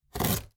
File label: Open Box; Helicopter; crashes bombs misc; Zomboid; Expanded Helicopter Events